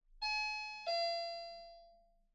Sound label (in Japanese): ピンポンチャイム; 3DIO; 家の中の環境音